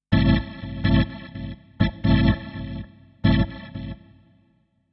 ORGAN VOCAL 125 A; Keys

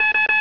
Busy; QV Digital Mate 1.6; QDM Toaster

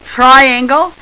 triang

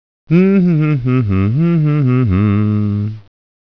humming

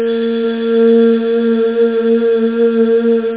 weird

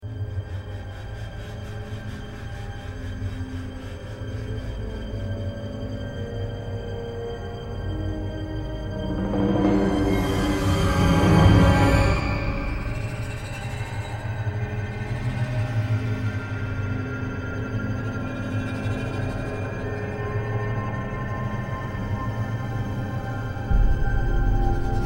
Sound Music; Identity Press Kit

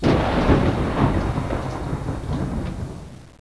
Thunder; Koji the Frog 2.0.1

